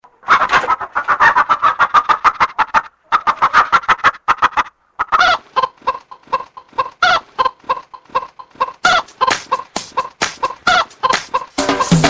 Rooster Remix

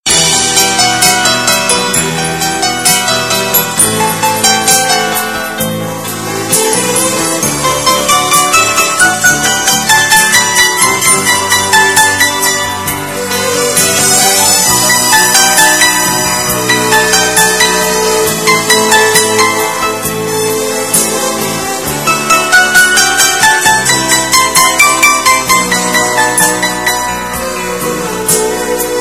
Soulful Piano

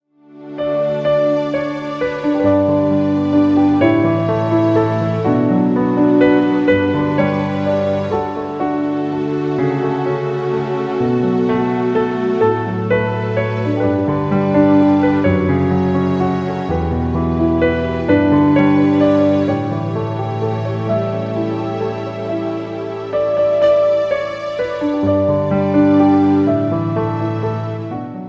Inspiring Tone

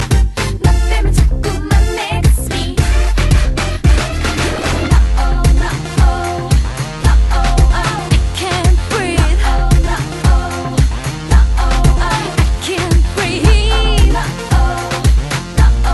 Breathe

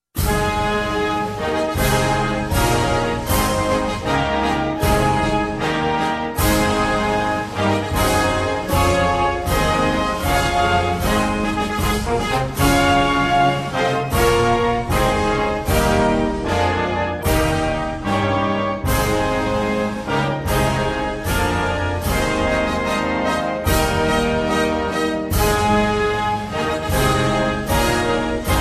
Anthem Of Lithuania

